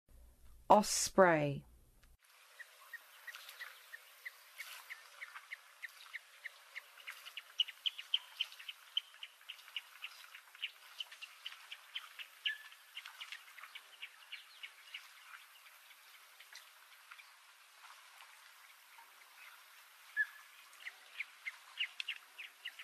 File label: Osprey, Songs of Birds of North America; Western USA; Western USA Songbirds